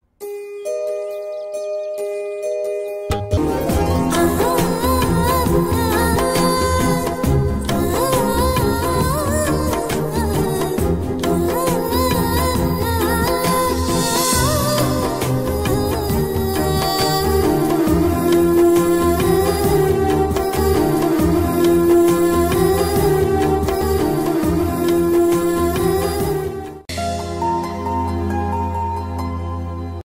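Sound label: South background music ringtone hindi ringtone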